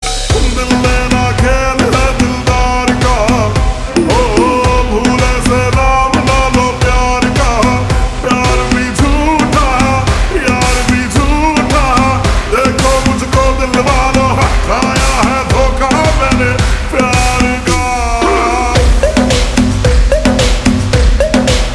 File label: Ringtone Slowed Reverb